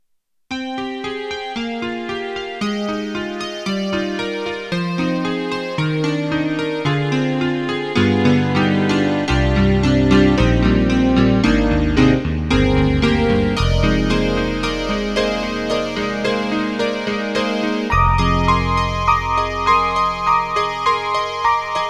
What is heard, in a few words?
CASIO GZ-50M STUDIO PIANO